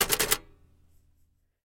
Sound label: Typewriter Back Spa; typewriters